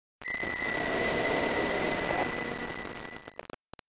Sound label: enraged scream